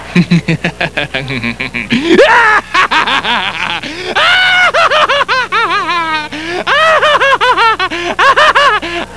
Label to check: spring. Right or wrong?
Wrong